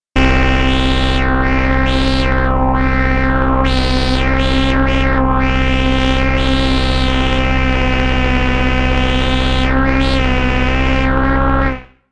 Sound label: cats on the keyboard